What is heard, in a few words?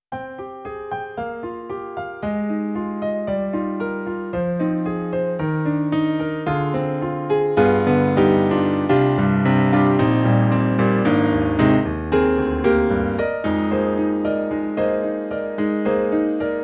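Perfect Piano; E-MU Sounds of the ZR Expansion ROM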